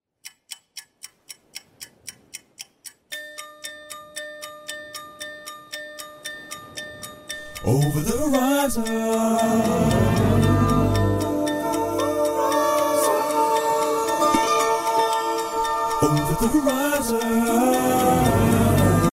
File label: ringtone